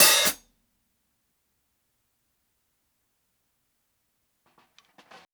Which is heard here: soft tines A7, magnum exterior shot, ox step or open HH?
open HH